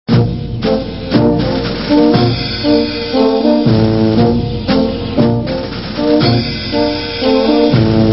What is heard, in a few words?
escape loop